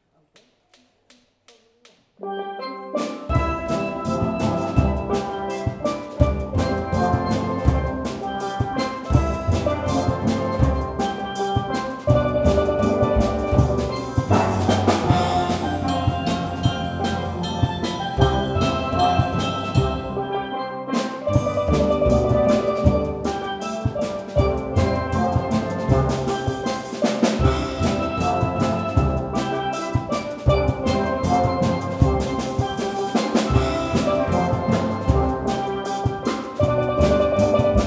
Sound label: - Steel Pan Instrumental